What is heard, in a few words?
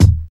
00s original hip hop kick drum sound d sharp